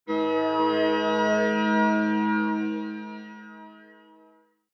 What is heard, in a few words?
sci-fi saturn rising